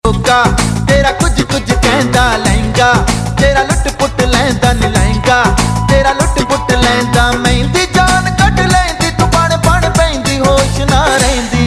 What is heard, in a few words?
Punjabi